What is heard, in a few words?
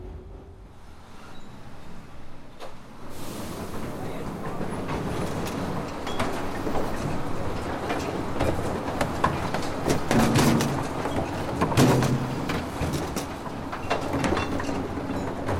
Taking Out The Dustbins; At Number 35 Dob Street